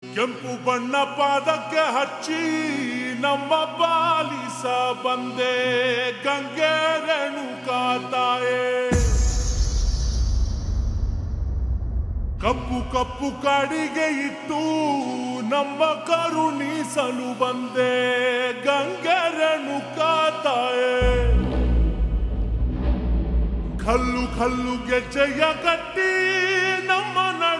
Ringtone Slowed Reverb; kannada

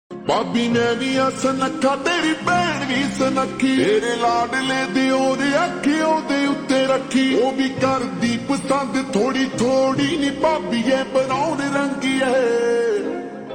Slowed Reverb

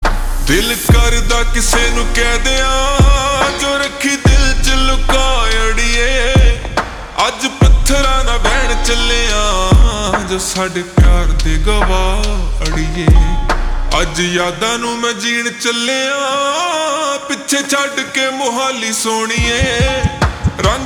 Slowed Reverb

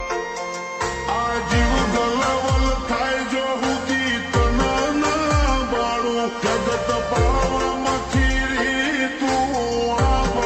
Slowed Reverb

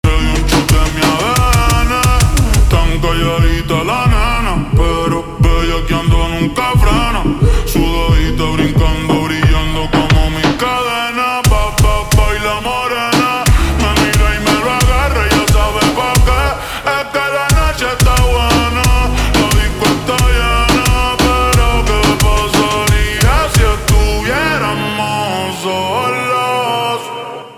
Slowed Reverb

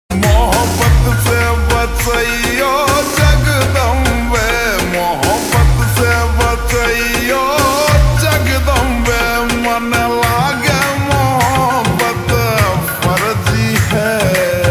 Slowed Reverb

